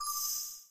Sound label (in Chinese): 消除音效